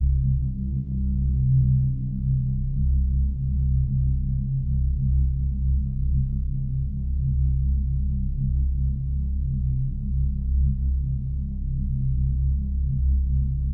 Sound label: Sidechain Bass; 808s